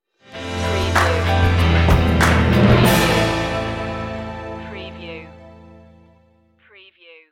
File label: stinger; pop-rock